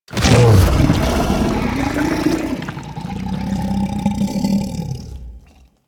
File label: Monster Door Unlock Roar; Warframe; Infested Eris; Monster Door Key